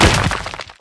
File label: Smash Rock; Playable Tutorial Level